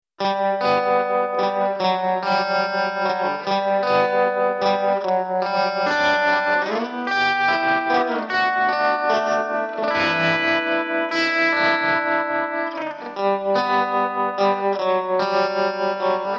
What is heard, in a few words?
final melancholy electric guitar